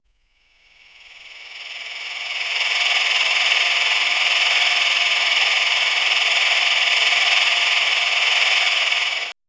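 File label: scifi robot crawler running, robotic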